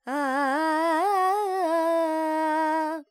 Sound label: Charukesi, Vocals, Carnatic Female, Alaps, G, Dry